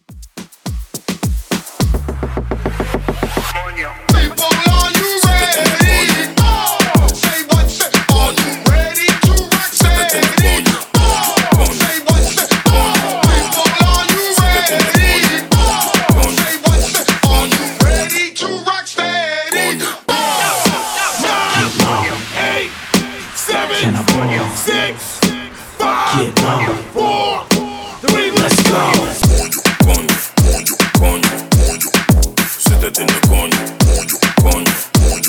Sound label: Party Breakz Reggaeton 105 BPM